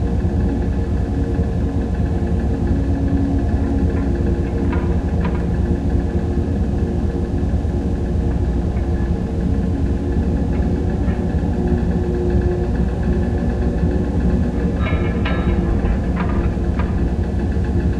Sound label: amb drone clattering machine